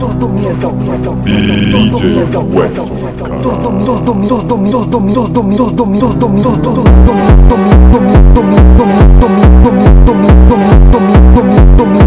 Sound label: Funk